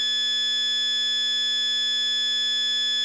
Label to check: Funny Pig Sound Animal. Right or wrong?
Wrong